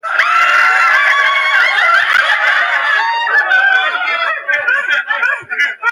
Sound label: laughing sound; spitting image